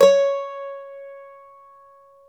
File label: MANDOLIN